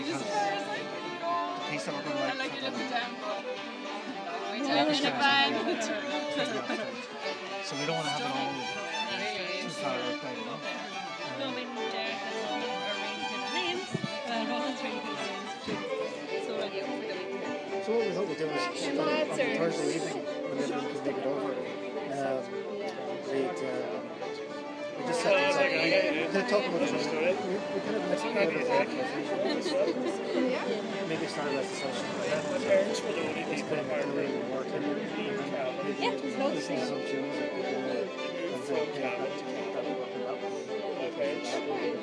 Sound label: traditional Irish music